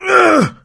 hurt critical, survivor voice; Left 4 Dead 2; coach

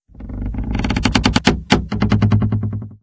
coaster creak; random amb sounds

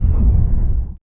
Battleship Hurt; Sid Meier's Civilization 4 Complete; Beyond the Sword; Final Frontier; Units

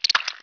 attack confirm; tx leech; Emperor - Battle for Dune

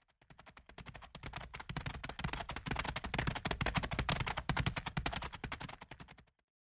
Begin Horses; Age of Empires III

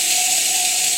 spray; AssaultCube; Alien Stars; American Muscle Series

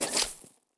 land; Ace of Spades

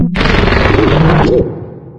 dog growl; Garry's Mod; hl2

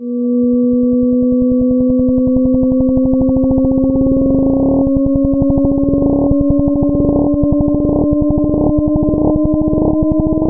teleport mechanism windup; Garry's Mod; hl2; labs

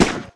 impact; BaboViolent 2

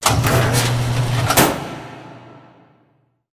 catwalk gate open

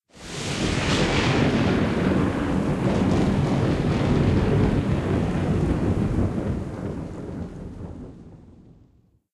gameover; Alawar; Crusaders Of Space 2

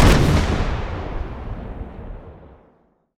ships destroyer attack; Hearts of Iron IV Death or Dishonor

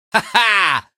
laugh; Garry's Mod; hl2; Barney

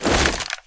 swish weapon swing swipe; Left 4 Dead 2

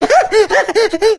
jockey lurk; voice; idle; Left 4 Dead 2

